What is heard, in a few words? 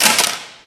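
physics clipboard impact medium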